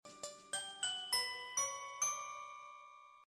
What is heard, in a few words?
message tone; Skins